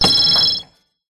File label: science fiction computer; Audio Mangler